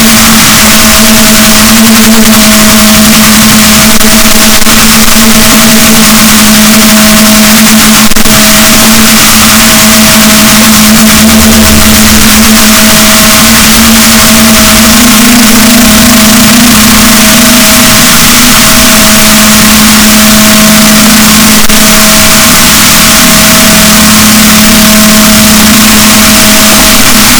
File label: rorquals, Balaenoptera Physalus, boat